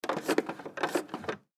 Soap Dispenser Press Twice, Public Bathroom; Bathroom (Sound Effects:Househo; Blastwave

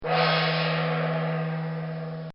Chinese Gong; Brass; Musical; Bobby Cole; recorded in a custom built sound booth

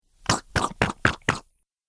Arcade Game Splatter Sound; Video Game Sound Effects; Retro Inspired Sound Effects - Think Nintendo, Atari, C64

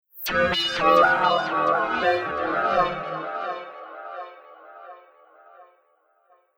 Near Future Fear Musical Fragment

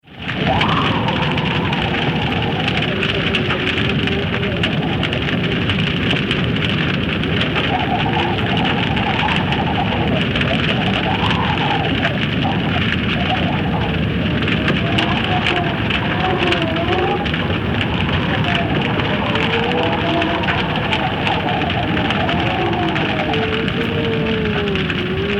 Large Wood Fire; Huge fire roar and crackle; Furnaces; Household; Tom Hutchings

